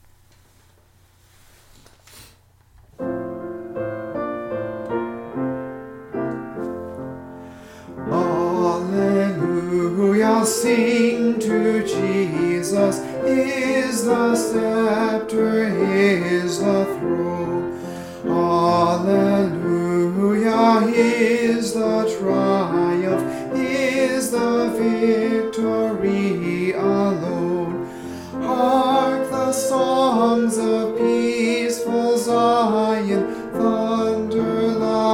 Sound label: Alleluia Sing to Jesus HYFRYDOL with recorders and piano